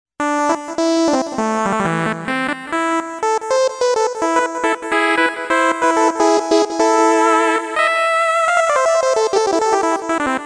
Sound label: moog